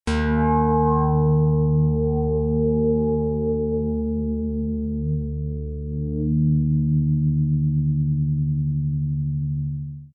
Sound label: lyre